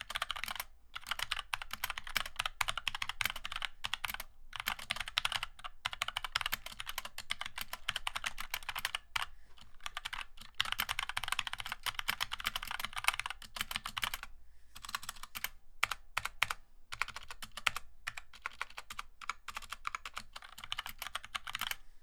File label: MagicForce Gateron Brown